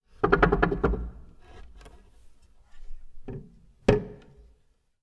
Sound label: Music Stand, Piano; Piano Miscellaneous